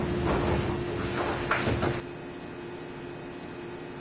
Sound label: door; tetsuon